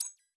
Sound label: Beep High Pitch